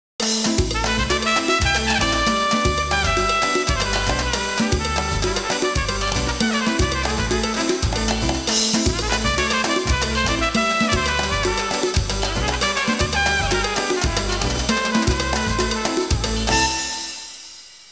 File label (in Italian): musica latina